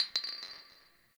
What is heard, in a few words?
Garbage Glass Drop; Warframe; Ambience; Nineteen Ninety Nine